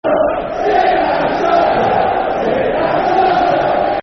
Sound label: Sit Down, Shut Up, Football Chant; Bristol Rovers Brfc